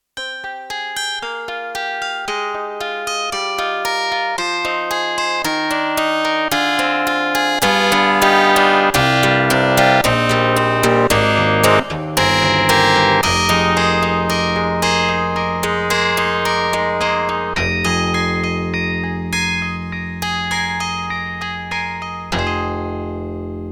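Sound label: YAMAHA MU1000EX, piano; HardyPlk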